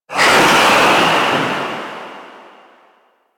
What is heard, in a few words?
Sandstorm Gust; Warframe; Mummy